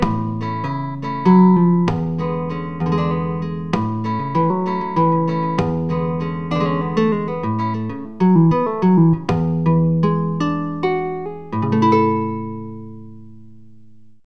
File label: dry, anechoic